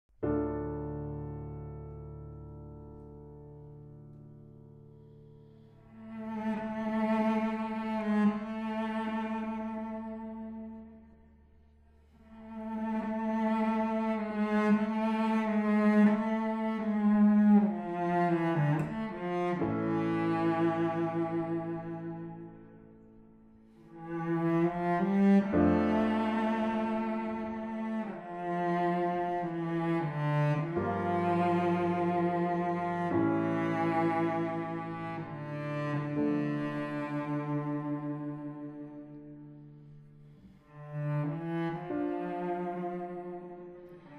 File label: andante religioso